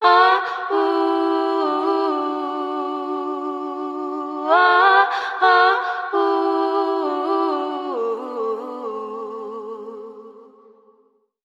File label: voice; Phonky